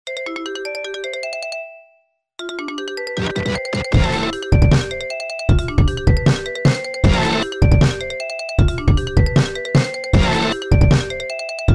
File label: Sony Ericsson remix ringtone